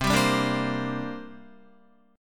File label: C 7th Suspended 2nd C down Guitar; C7sus2 strummed